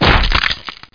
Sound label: ice hit; Hexen II; crusader